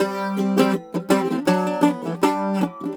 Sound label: Celtic Flavours; USB Soundscan vol.42; AKAI